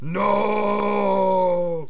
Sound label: falling; sarge